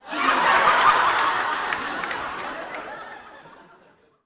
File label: Audience Laughing; WoW Sitcomify; laughtracks